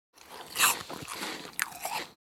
Apple single bite fruit crunching eating

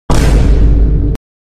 Meme funny bass hit; bass boosted

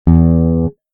Squier telecaster E low string

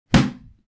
Boxing blocking a punch

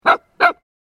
Small dog growly barking barks

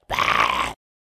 Goblin emotion bad aggressive

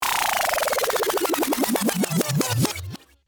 Classic vinyl rewind

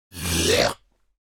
Male clearing his throat

